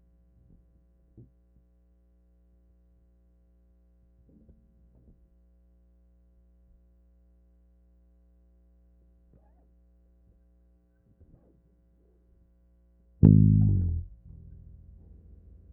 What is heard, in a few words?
bass takes